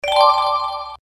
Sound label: page flip